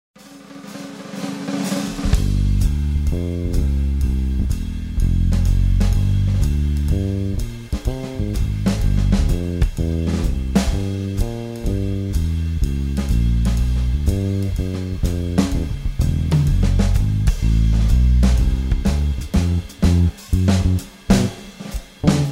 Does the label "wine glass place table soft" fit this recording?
No